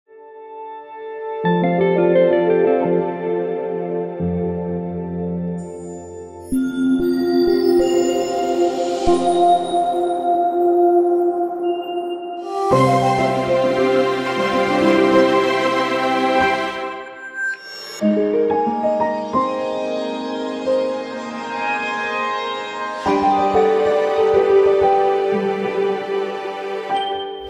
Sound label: TV Logos Examples